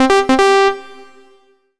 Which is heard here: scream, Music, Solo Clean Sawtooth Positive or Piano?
Solo Clean Sawtooth Positive